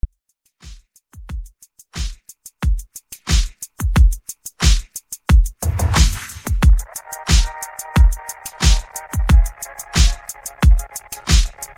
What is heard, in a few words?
Top40 Mixshow 90 Bpm